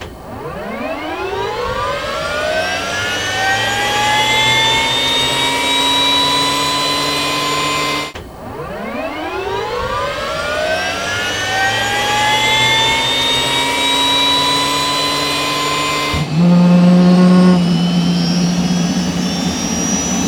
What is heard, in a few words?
start; Cosmic Rage